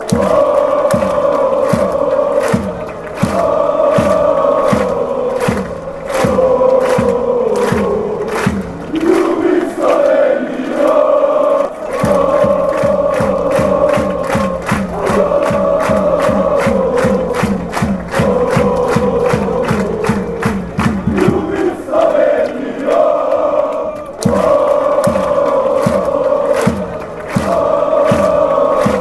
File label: slovenia chant, fan chants